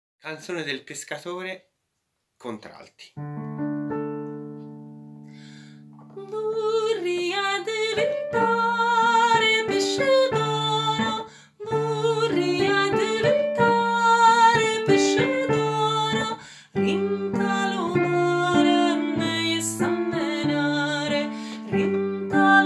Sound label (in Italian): Canzone Pescatore CONTRALTI